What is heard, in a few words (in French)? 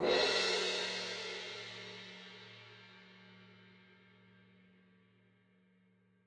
Cymbale; Legend of Keepers (gamerip) (2019)